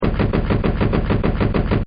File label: MORTAR; Guns